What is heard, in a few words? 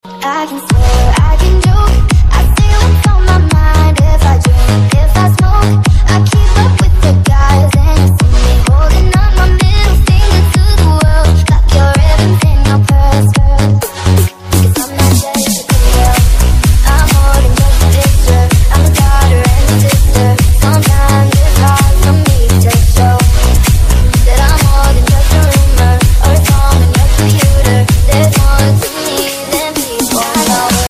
Remix Nhạc chuông Android